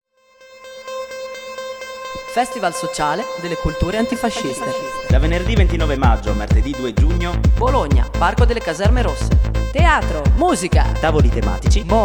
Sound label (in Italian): spottino electro